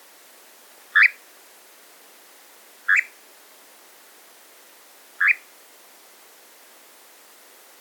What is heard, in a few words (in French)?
engoulevent d europe